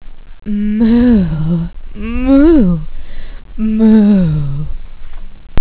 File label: sexy moo; hall of moos